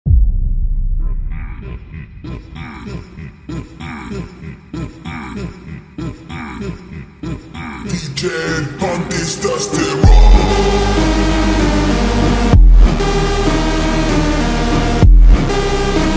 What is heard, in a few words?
montagem slowed reverb